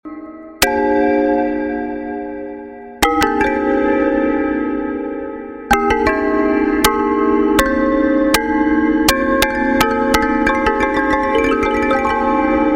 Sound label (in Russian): knopka